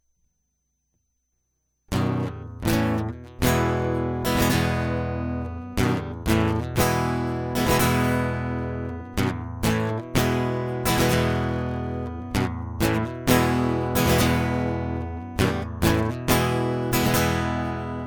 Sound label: Uptick Guitar Loop